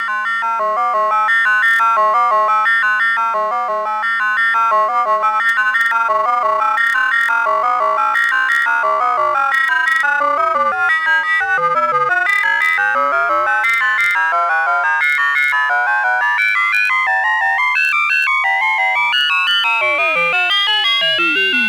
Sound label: Klang Sequence